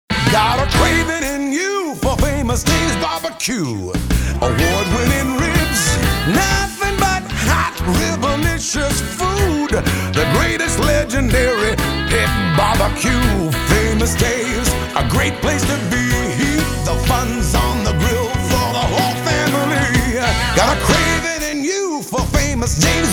BBQ Full Sing, bbq jingles; Famous Daves

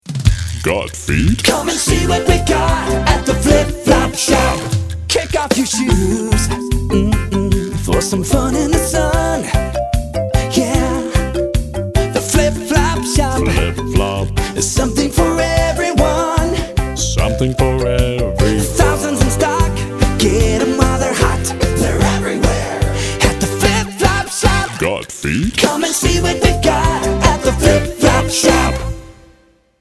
Flip Flop Shop, shoe store jingles

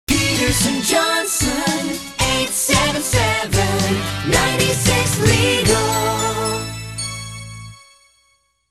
Classic, lawyer jingles; Peterson Johnson

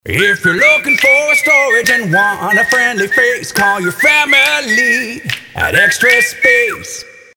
JINGLE, storage jingles; Extra Space

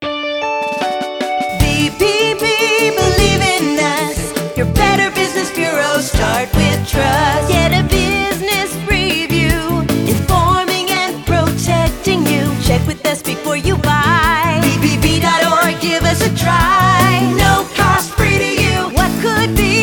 business jingles; Better Business Bureau

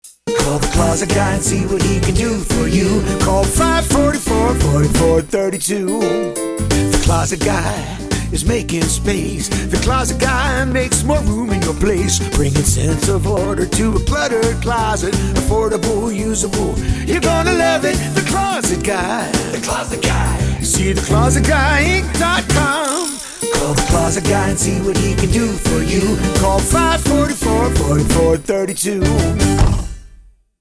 full, home builder jingles; Closet Guy

